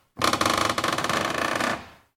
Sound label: random wood creak; Devour